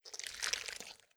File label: Goule Moove